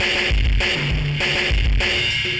ind dist ride

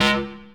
stab; Dirt-Samples

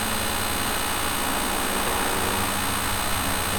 fan

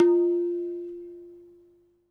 hi hit, tabla; Dirt-Samples

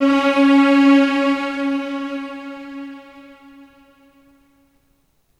arp

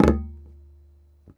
bass flick, tabla; Dirt-Samples

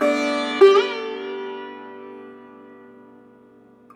d maj sitar chord

